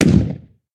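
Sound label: Capsule Explose Ballon Heavy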